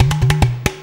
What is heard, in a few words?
WATERCAN; Best Service; World Colours